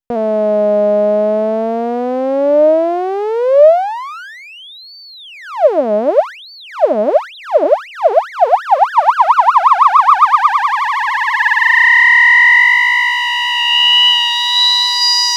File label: LFO Audio rate